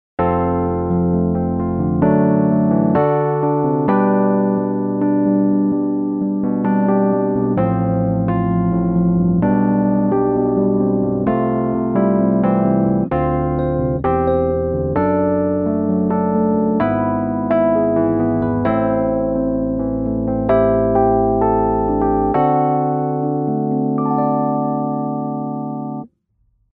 Rhodes DI

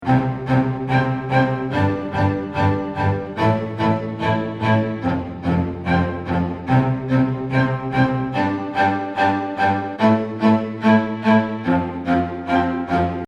low n hi strings

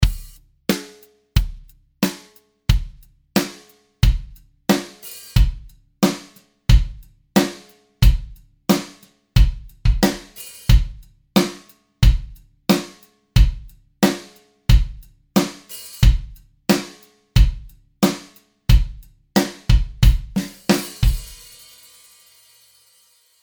DRY DRUMS TO ROOM BLEND

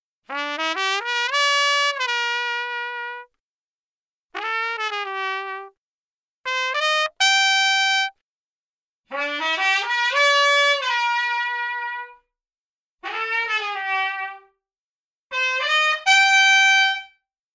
TRUMPET DRY ALTIVERB

